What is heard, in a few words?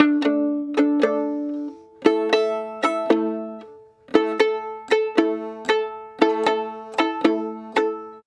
Arabic Violin; Sonic Foundry (Sony Creative Software); Continental Drift Aftershocks